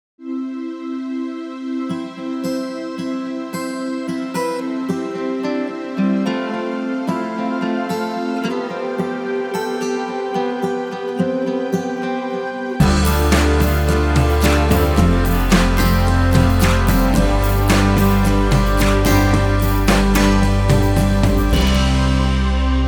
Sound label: INSTRUMENTAL